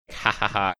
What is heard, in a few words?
hahaha